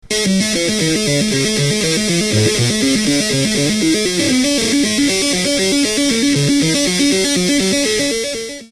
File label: killall tapping